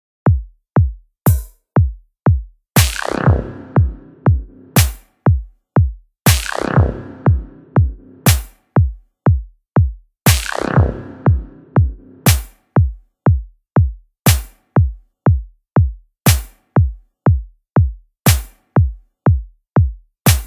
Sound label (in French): musique psy trance